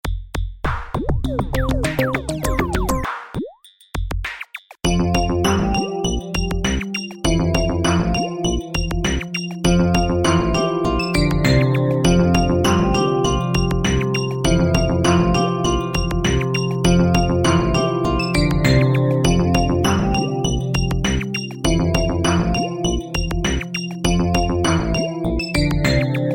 mpb piano em neon

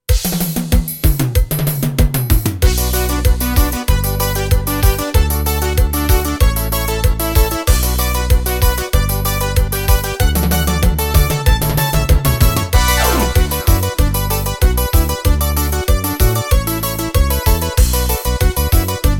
ritmos profissionais

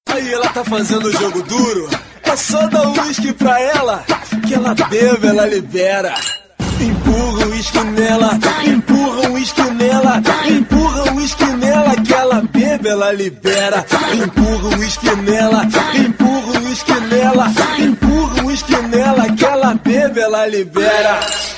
funk

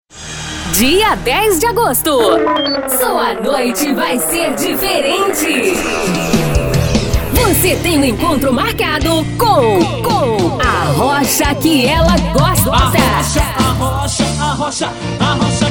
arrocha, chamada

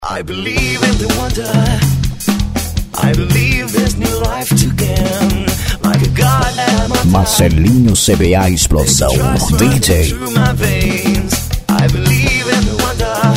lambafunk

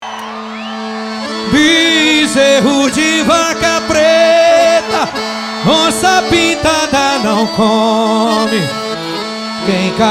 aboio ao vivo; no ctn

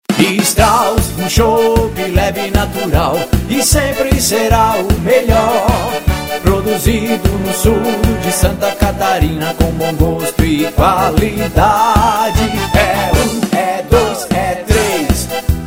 jingle chopp; Strauss Bier

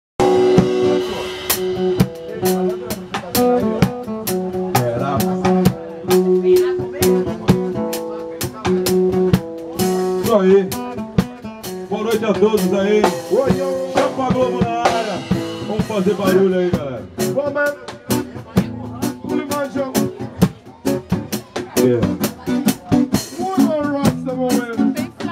lual praia; vermelha ubatuba 2015

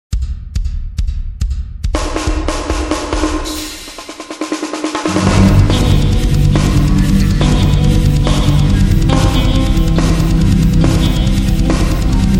preludio em sol maior

